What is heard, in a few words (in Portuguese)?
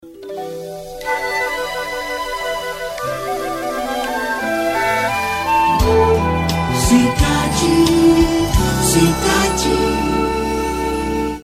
Vinheta; Cidade FM